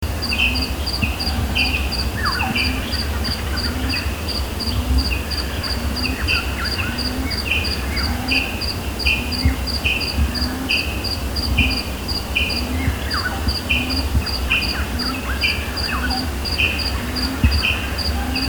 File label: lemon dove